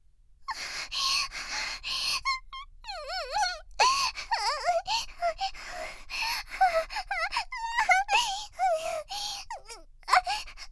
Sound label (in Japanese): 絶頂に近づく喘ぎ